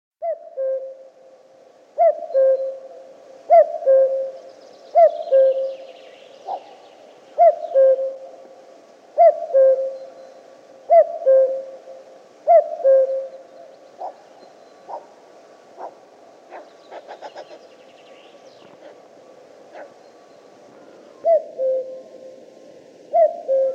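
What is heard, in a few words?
obyknovennaya kukushka golos, common cuckoo voice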